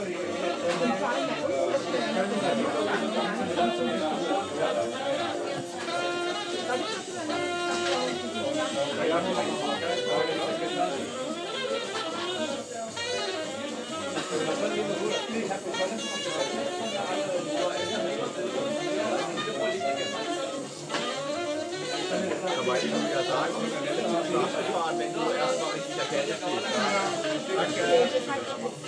one min metronome

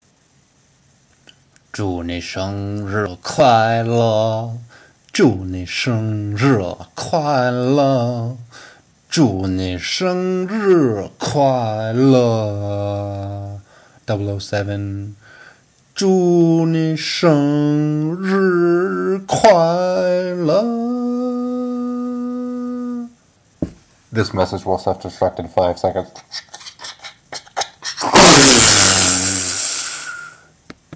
happy bday in Chinese

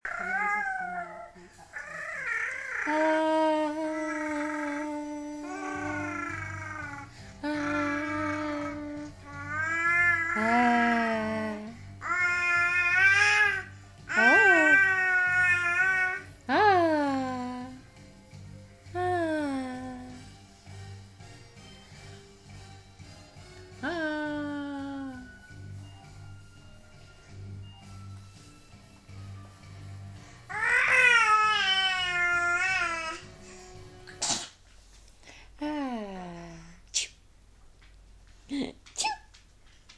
Singing With Mummy

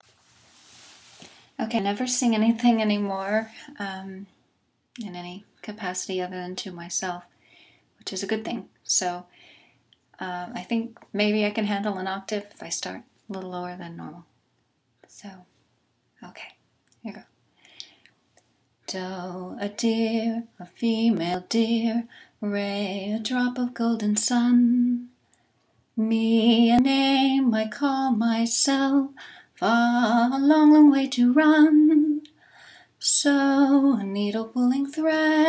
very rusty pipes singing one octave quite badly

